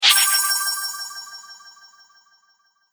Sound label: Coin Magic, magic sound effects